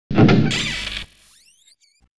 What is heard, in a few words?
roomdoor die